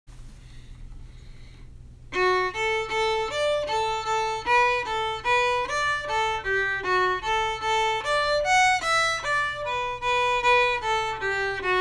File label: connachtnans rambles slow